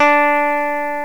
Ibanez A String; From Aminet

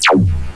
Fire Bullet Military Sound; freedroid

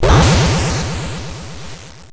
Smart Bomb; Io

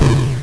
Rail Slider Death; Io

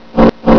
Cry Sound; freedroid

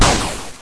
Bomber Death; Io

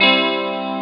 Guitar Sample; From Aminet

